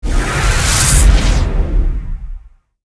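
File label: Elf Sage Skill Cast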